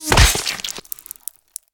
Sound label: impact; Original TK Sounds